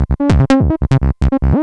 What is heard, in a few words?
square